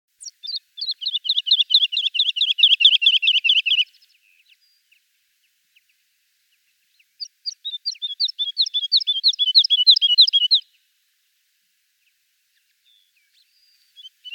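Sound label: woodlark voice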